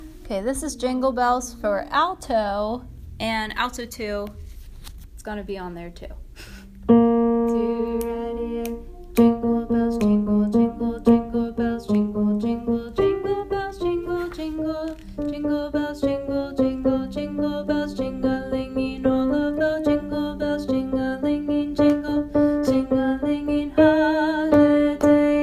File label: jingle bells alto